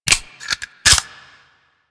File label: Blaster Rifle Reload; Grineer